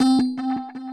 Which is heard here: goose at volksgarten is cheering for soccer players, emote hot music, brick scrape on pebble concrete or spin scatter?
spin scatter